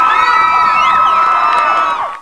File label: CHEERS